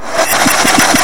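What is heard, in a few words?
mower startup interior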